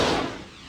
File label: Flyby Missile Slow Mono